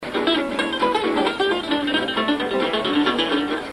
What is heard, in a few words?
wauwau dominant lick